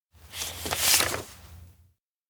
paper offer; anemoi

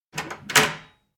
door community center open; anemoi

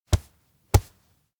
tap chest fist; anemoi

